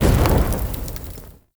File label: Fire Pillar Ignite; Objects